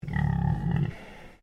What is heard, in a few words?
Animal Pig Landrace Inside Grumble; Edgar; Edgar's Mission Farm Victoria; Pigs; Animals